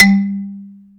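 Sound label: XYLO G 2, percussion; AKAI S-Series CD-ROM Sound Library VOL-8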